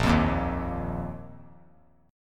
Bb Suspended 4th Bb down, chord; Bbsus4 strummed

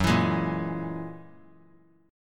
Gb 9th Gb down 3C,3F,3A,3D; strummed; JGuitar

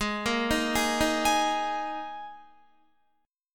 G sharp Suspended 2nd, Guitar; arpeggiated; 16,13,13,13,15,15; 4E,4A,5D,5G,5C,6F; G#sus2

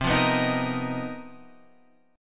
B Major 7th Flat 5th C; strummed; BM7b5/C; JGuitar

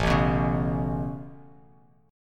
A Major Add 9th A Bass Standard, chord; Aadd9 strummed; JGuitar

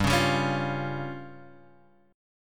F Suspended 2nd Sharp 5th G

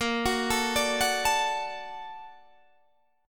A sharp Augmented Major 7th A sharp Guitar DADGAD

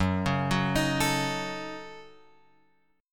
F sharp Minor 6th F sharp; F#m6 Chord {2 4 4 X 4 5} arpeggiated

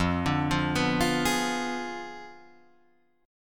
F Diminished 7th F down Guitar Drop D

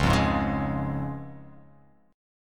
Db Major Db; strummed; JGuitar

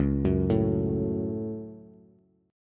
C sharp Suspended 4th Sharp 5th C sharp down Bass

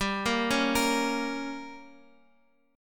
G Diminished, Guitar, Open F; arpeggiated; JGuitar

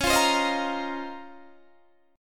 Db Major 13th, Guitar Standard; strummed; JGuitar

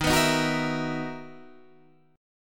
B Major 11th D sharp, guitar

